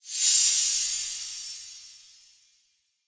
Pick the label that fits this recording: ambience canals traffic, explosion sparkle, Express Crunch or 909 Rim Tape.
explosion sparkle